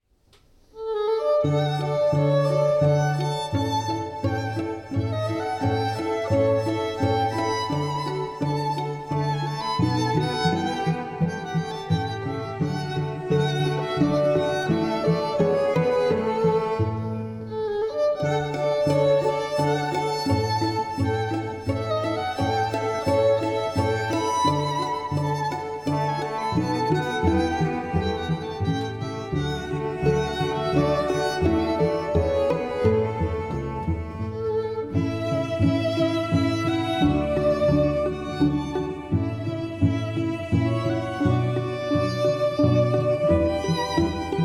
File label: Classical Party Music